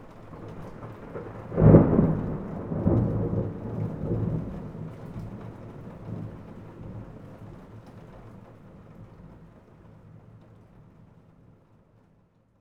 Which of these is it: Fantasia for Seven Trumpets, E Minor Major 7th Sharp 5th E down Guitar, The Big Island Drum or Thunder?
Thunder